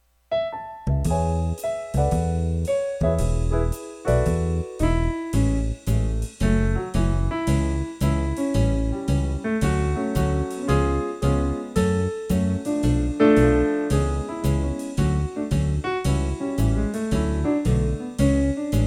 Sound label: Pianist